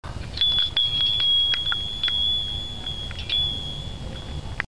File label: Door Bell Bell